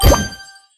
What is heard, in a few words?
striped created; Candy Crush Friends